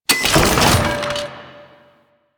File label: Key Deposit; Assassinate